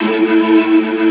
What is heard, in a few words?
A2, Keyboards Old School; USB Soundscan vol.09; AKAI; BIG HAMM